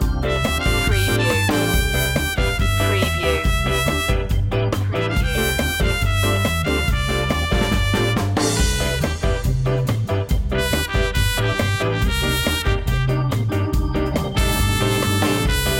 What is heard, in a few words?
ska loop